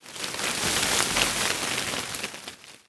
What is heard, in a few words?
foliage react explosion